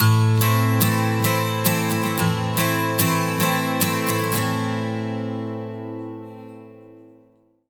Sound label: 110 MIN 12 STR, Acoustic Guitar Loops; USB Soundscan vol.04 - Electric & Acoustic Guitar Loops; AKAI